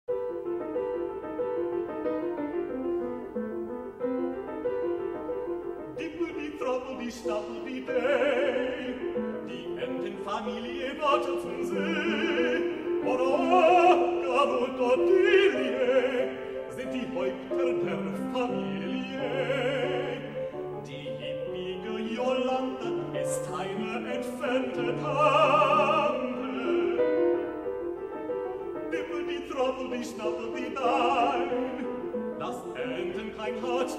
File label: Animal Recital quick tempo German